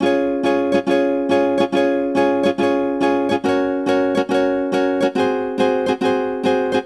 ukulele

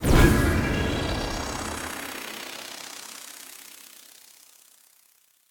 Spell Enchant Seeds

